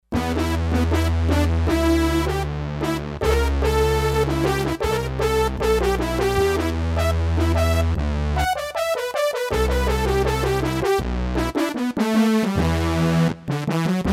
Fat Lead, Roland D-10; Factory Patches

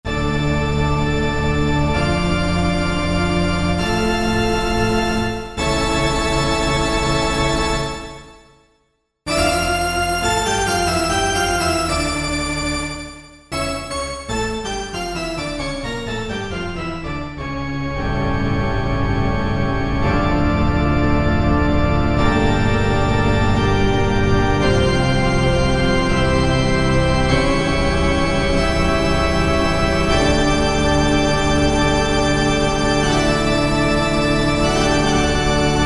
Pipe Organ

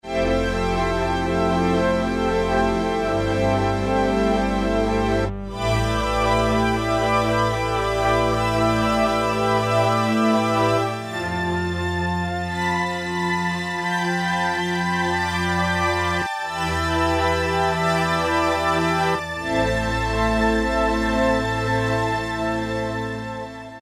SWIRLING STR, Casio VZ-10M; VZ ROM Card

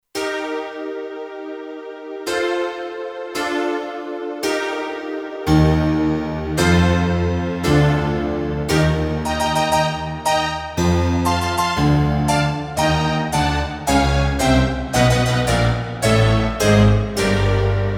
Piano Chase, Kurzweil K2500X; Factory Programs

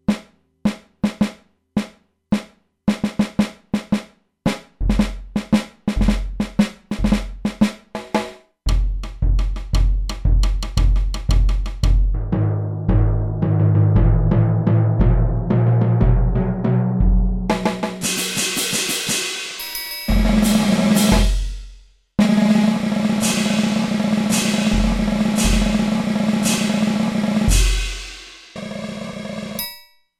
Percussion, E-mu Proteus-2; Factory Presets

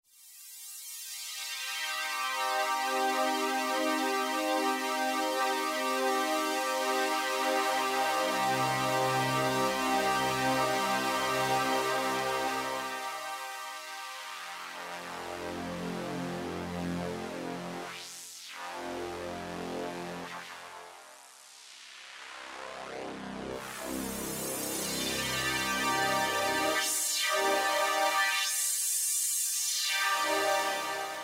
Converge, Roland SR-JV80-04 Vintage Synth

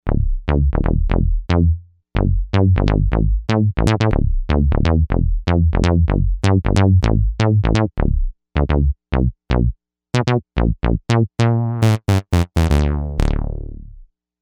Plain Juicy Bass; Novation UltraNova; Factory Patches

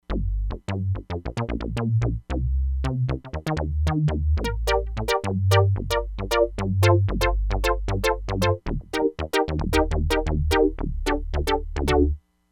SLAP, Crumar Bit 99; Factory Programs

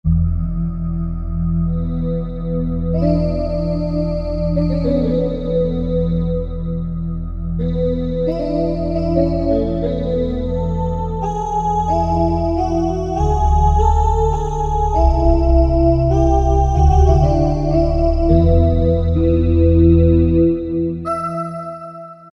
FV Wave, Korg M1; Factory preset examples

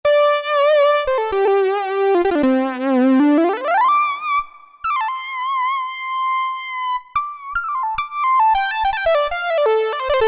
SL SawMono, Yamaha TG77; Factory Presets

